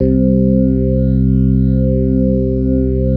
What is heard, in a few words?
dance chord